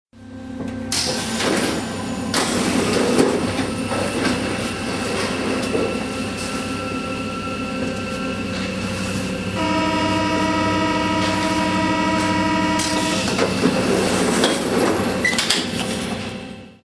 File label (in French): metro